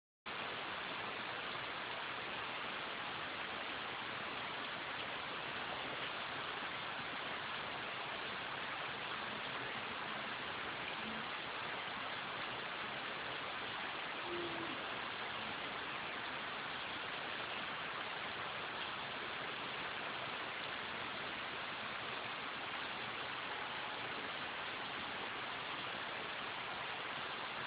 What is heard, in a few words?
rain